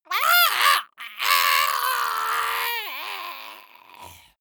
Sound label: monster death scream high